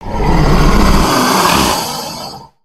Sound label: Dragon Roar; creatures organic